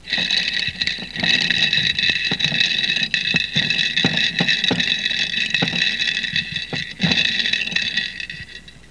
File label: RATTLE